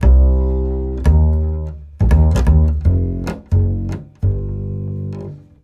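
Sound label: 085 Acid Jazz Doublebass C major; Pro Tools Essential Loops; Digidesign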